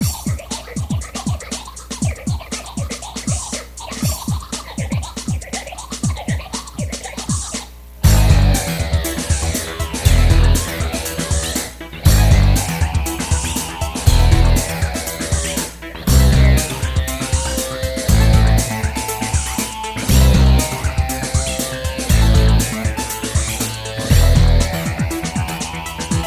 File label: techno